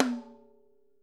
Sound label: ROTOTOMS; Sonic Reality